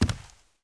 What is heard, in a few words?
step; KINGPIN; player